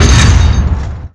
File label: Step Left; The Elder Scrolls III - Morrowind